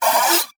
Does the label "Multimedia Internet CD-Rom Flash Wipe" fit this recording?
Yes